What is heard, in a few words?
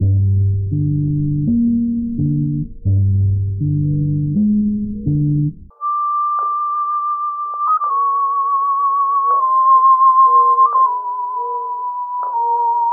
strings flute sax, Sad Loops